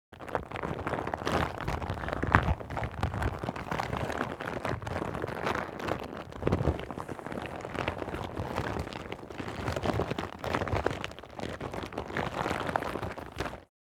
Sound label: Rubber mat handling and stretching